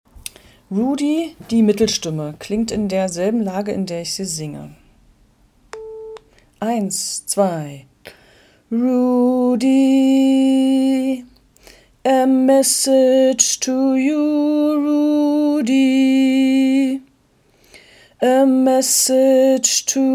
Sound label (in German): voc Mittelstimme